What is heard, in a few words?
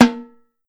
Perc Timbal